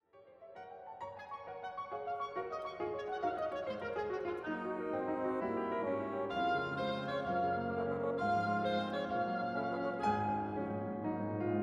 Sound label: piano wind; poulenc